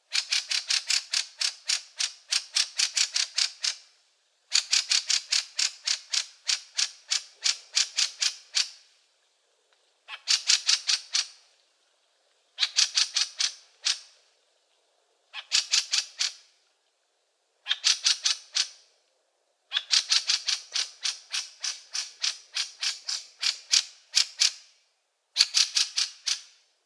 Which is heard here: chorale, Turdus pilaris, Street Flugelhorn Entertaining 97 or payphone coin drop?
Turdus pilaris